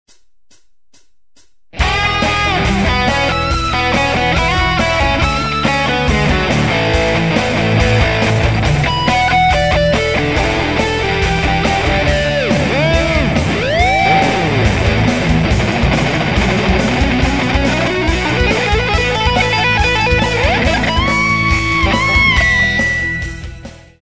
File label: ac jam guitar battle